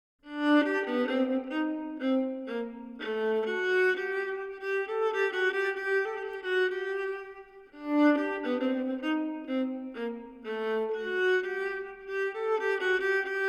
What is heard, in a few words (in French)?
violon contrechant; baroque; airs sérieux et à boire